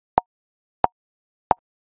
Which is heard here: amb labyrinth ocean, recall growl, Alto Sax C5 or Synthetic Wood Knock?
Synthetic Wood Knock